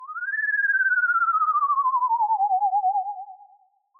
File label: tone; Halloween; seasonal